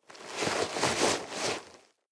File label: nylon leather walk